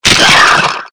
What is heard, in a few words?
skeleton hit; Skeletal Ravager